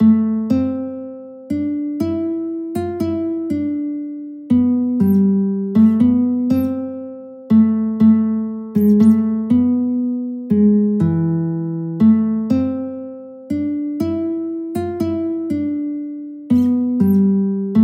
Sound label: greensleeves guitar